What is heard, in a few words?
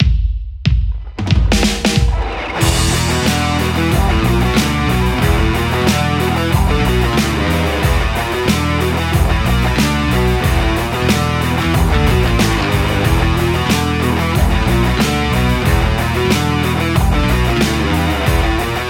Les Paul; rcvprod amplistortion